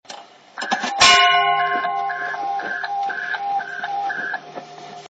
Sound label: klaxon warble tone